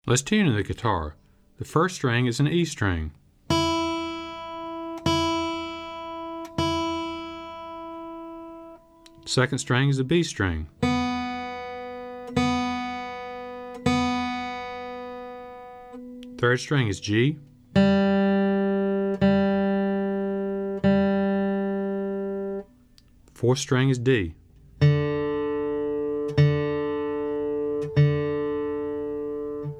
Tuning

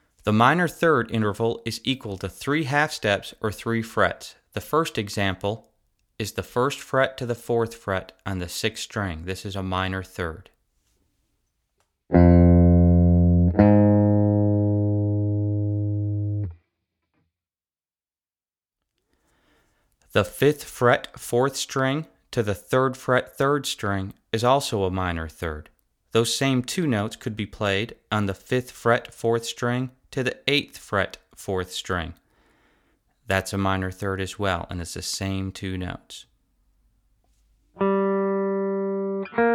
Minor Third

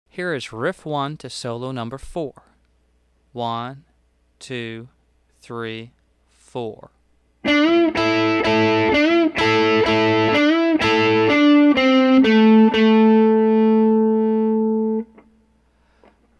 Double Stops Riffs

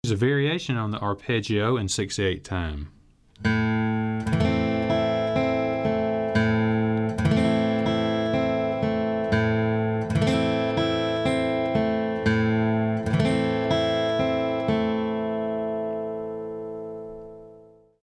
Arpeggio Variation

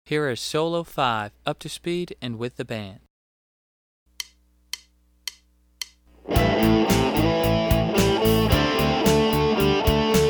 Solo Fast

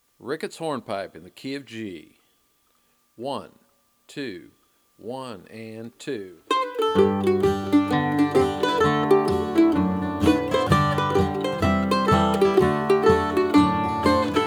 Ricketts Hornpipe

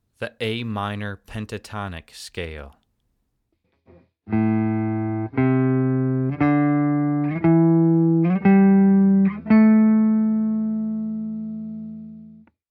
A Minor Pentatonic Scale

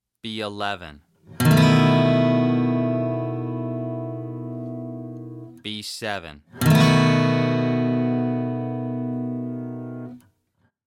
B7 B11 Chords

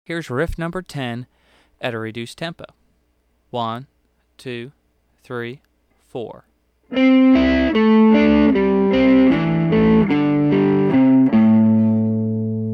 Hot Licks Riff

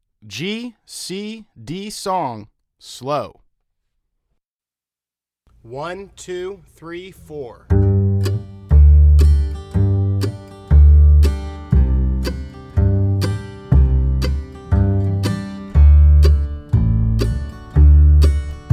Slow; GCD Song

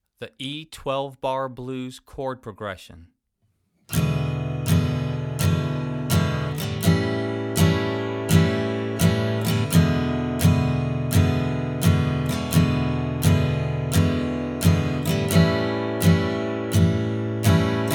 12 Bar Blues

